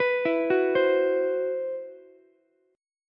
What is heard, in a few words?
C Major 7th Flat 5th, Ukulele, chord; CM7b5 arpeggiated; JGuitar